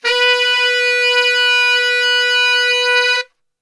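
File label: B 2X SAX STR, saxophone; Best Service; Brass Super Section; SAX STRGHT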